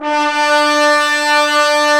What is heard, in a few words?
BRS, Roland LA Composer; Tbns